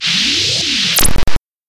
whoosh; Whooshes and sweeps

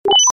beep

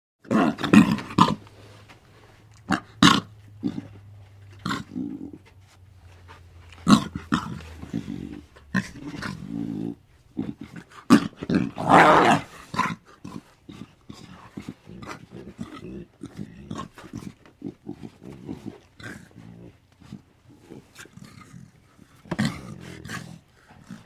2 Lion Cubs Small Growls; Birds And Animals

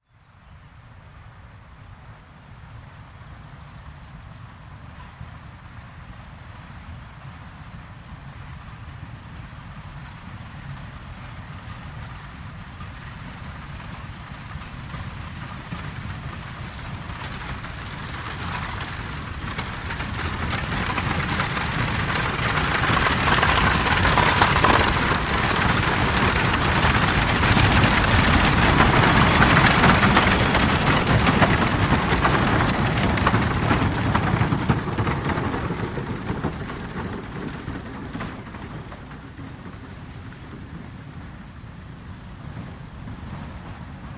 Horse Drawn Carriage And 4 Wag; Horses Carriages and Traps; Hollywood Edge Historical Series

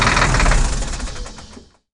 TRUCK

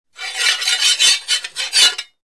Broad Sword Scrape; Hollywood Edge Martial Arts and Human Impacts

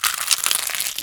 CRUNCH, CARTOON; 1000fx

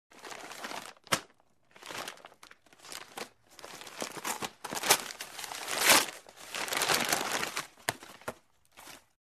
Paper Gift Wrap Paper Handling; Hollywood Edge Foley Sound Library

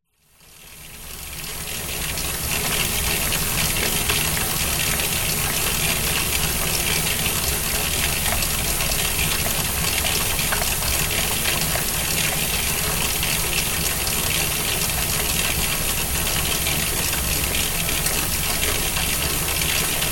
rain on building; SOUND EFFECTS1

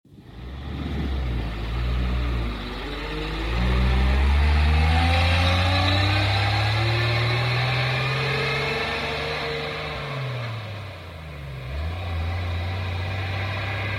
Truck; SOUND EFFECTS1

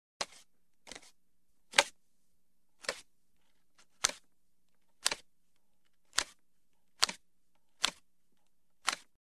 Cards Dealt From Shoe Steady; Hollywood Edge Foley Sound Library

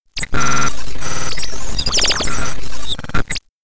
tuning

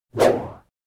Swish Electronic Swish; Crashes Impacts

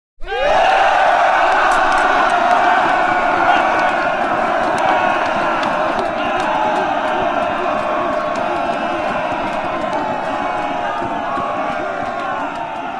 Infantry Roars 1000 Men Roar; Hollywood Edge Historical Series; War Horses Armies & Weapons

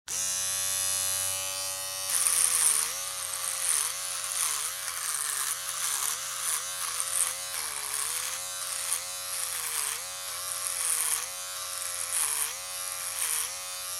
Electric shaver; SOUND EFFECTS1

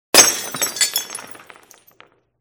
Pop and Splatter; SOUND EFFECTS1

